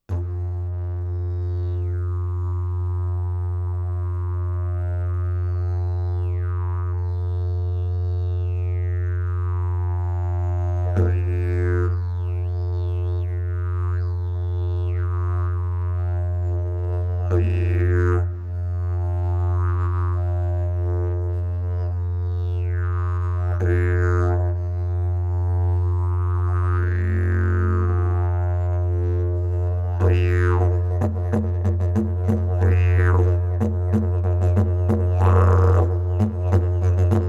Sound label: didge